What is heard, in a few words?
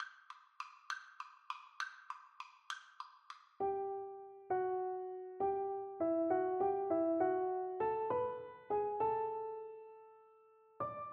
piano duet